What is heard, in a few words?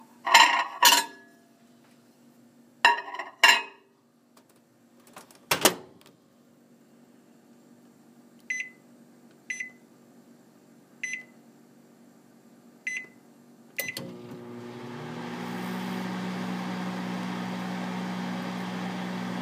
Microwave